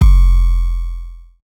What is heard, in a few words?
808 Bass Banshee F3; Slate 808 Deep Dive; SD Signature 808s